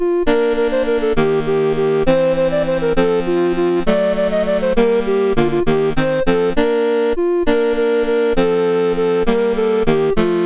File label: We wish you a Merry Christmas, MOS6581R3